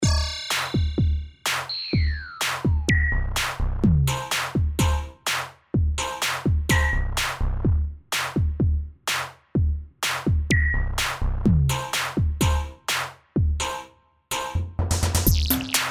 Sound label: virus kits